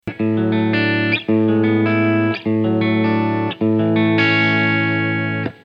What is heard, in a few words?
sing tail high bias